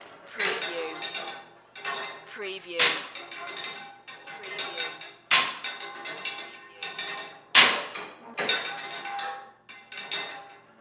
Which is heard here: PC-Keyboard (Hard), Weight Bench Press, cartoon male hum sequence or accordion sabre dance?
Weight Bench Press